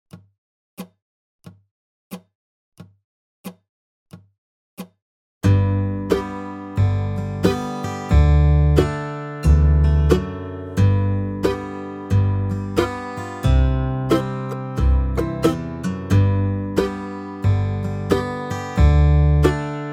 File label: simple chords - 45 BPM; Auld Lang Syne; Holiday 2025 Dobro Zoom Workshops